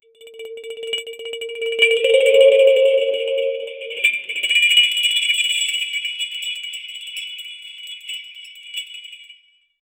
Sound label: ultra short ui chime two, chime